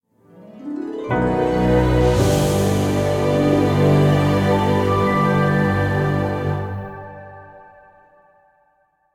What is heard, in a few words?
stinger chapter complete; roguebook